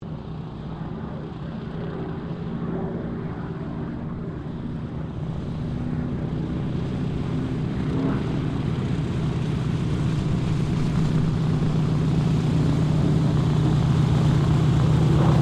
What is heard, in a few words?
AIRCRAFT LOCKHEED HUDSON TAXI SPITFIRE FLYOVER, Hudson twin engine light bomber; Airplanes (Sound Effects:Vehicles)